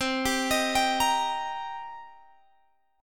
C Minor 7th, Guitar, Open G

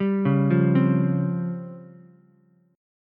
C sharp Major 7th Flat 5th, C sharp, Ukulele; arpeggiated; chord

